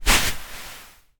beanbag jump on; household; zapsplat

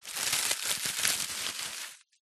foley dried leaves movement crunch

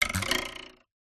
cartoon twangs springs pop up x2

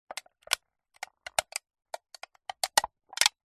foley drinks can very light crush handling empty

